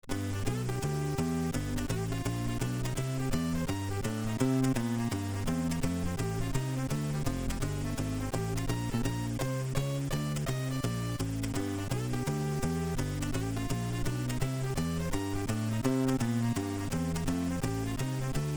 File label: Jazz; sid